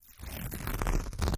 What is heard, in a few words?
Heavy fabric is torn into strips. Tear, Fabric Rip, Fabric